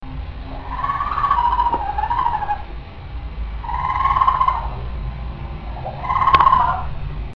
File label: Raccoon Chattering